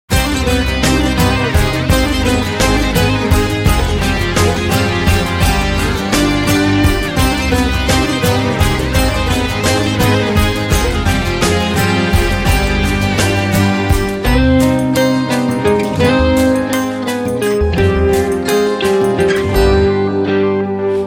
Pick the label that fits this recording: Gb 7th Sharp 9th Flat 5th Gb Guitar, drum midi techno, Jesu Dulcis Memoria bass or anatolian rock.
anatolian rock